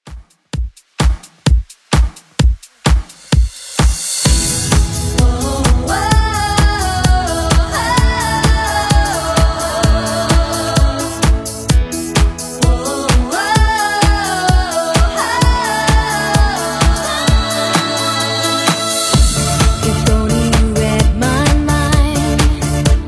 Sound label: 90s Redrum 129 bpm